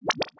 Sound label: Soft, Keytone sounds Piano; LG GB255g